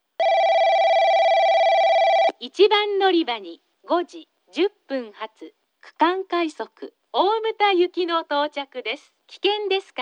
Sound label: kurosaki regional rapid omuta, portable recording; 1番のりば接近放送（区間快速 大牟田行き）; 鹿児島本線